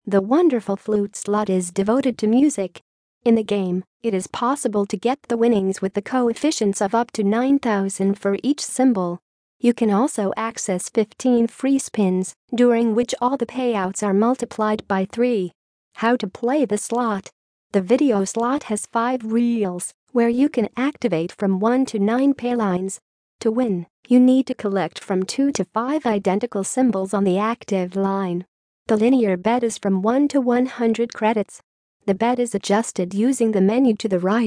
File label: Wonderful Flute